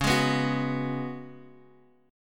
C sharp 7th Suspended 4th C sharp, Guitar Half Step Down